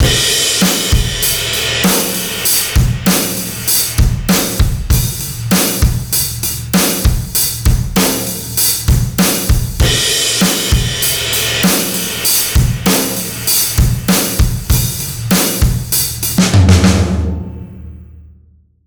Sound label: pumping drums; saturator x